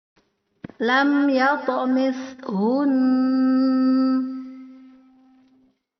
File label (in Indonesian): Perekaman baru Karaoke denoised